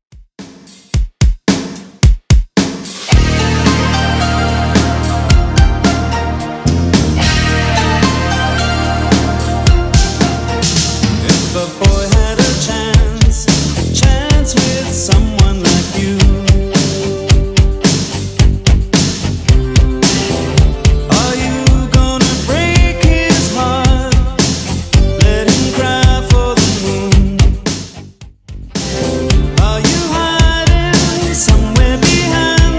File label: 80s Redrum 110bpm